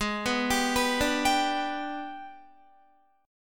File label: Ab Minor Major 7th Flat 5th, down, Guitar; arpeggiated; AbmM7b5